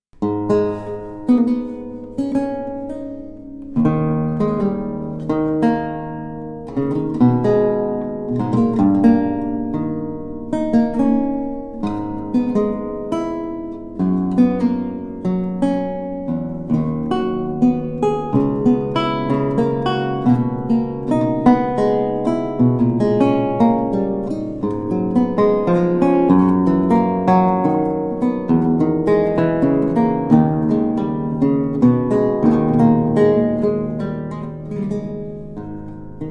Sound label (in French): Prelude, Luth; Charles Mouton